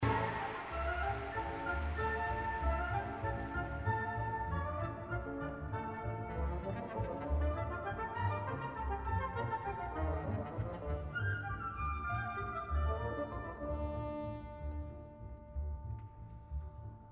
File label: Tenors